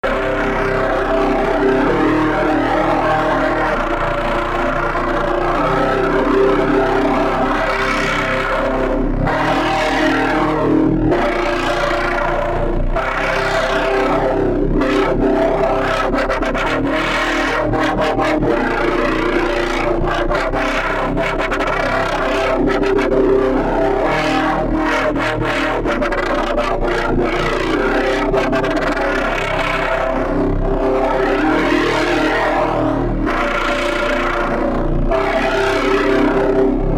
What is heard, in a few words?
dubstep